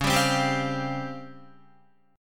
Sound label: Db Major 7th Flat 5th, Guitar; DbM7b5 strummed; JGuitar